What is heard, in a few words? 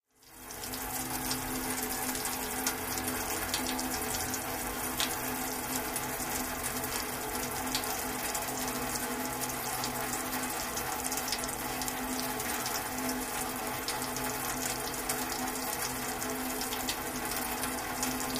Rain Fall On Metal Stee